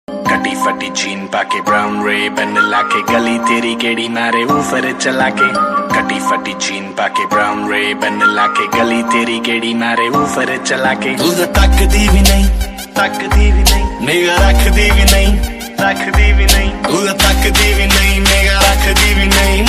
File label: Punjabi Ringtone